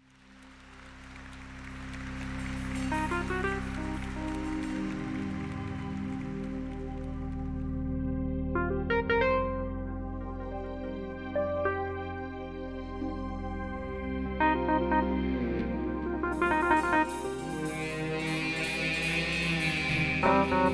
deep rock

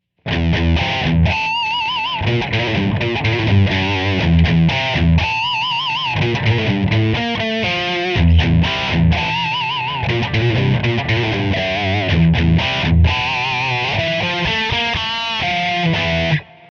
space metal

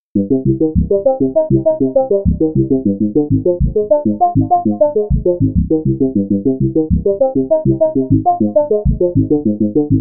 Velo FM Soft Bass

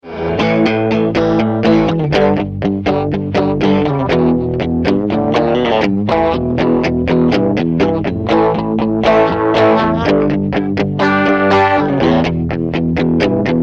fx loop

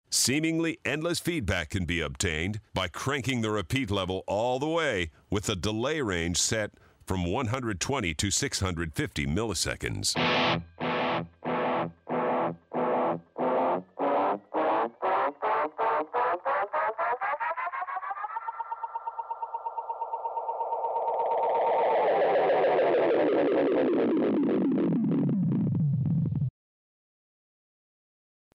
Endless Feedback

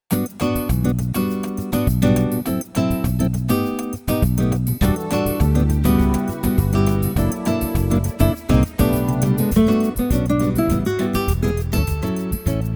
Brazilian Samba

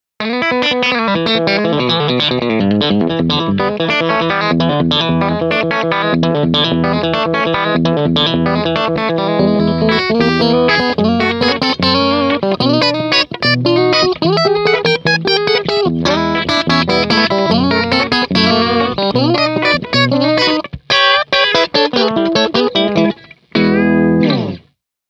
country lead

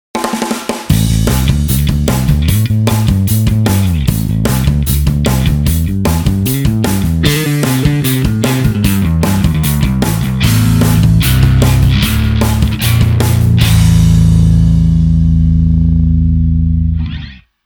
TRIAC Bass

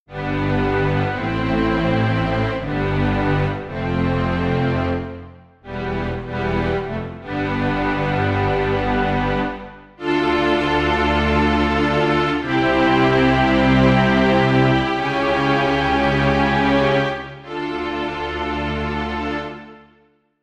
Full Strings

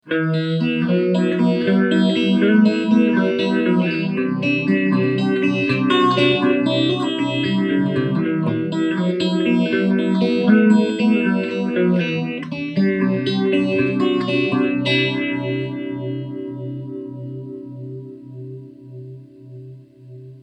Full Phase Medium Speed; Red Witch

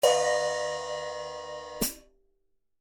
HH ZXT 14 RPR 14, rock hat

